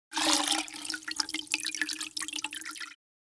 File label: Washing clothes in the sink dripping water; cleaning